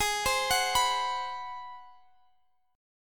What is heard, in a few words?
G sharp 7th Sharp 9th, Guitar, Drop D